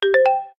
Triple, notifications; Coolpad; Note-8

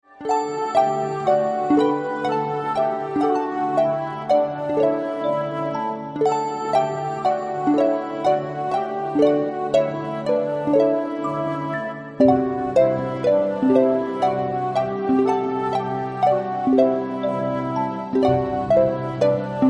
Music Box; China Mobile A3s

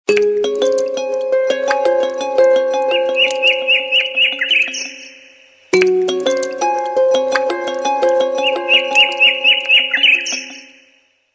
Harp Water; Hisense; A5; ringtones

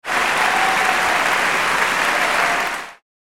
ringing tone; Nokia 6270; Football

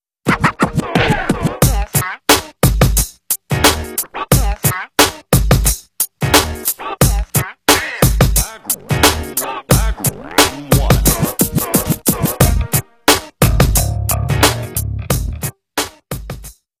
beat box; Motorola; ROKR-E8

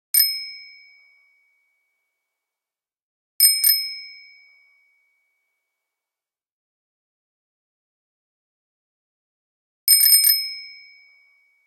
Bicycle, Nokia Tones; Windows Phone apps

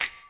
camera click; Nokia; indonesia

